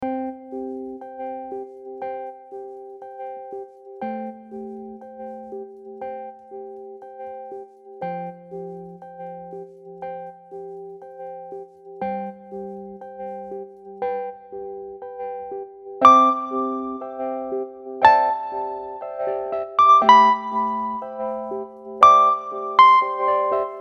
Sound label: romantic